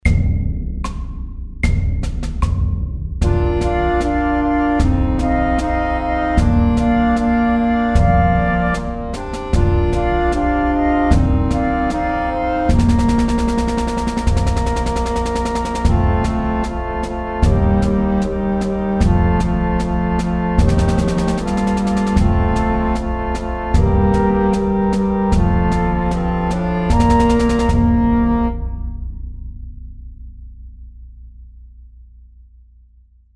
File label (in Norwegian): Aspirantmarsj SLOW 76